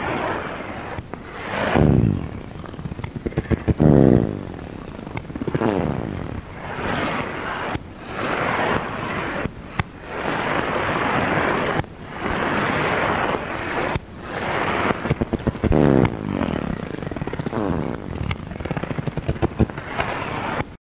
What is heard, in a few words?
QRM ultrasonic animal scare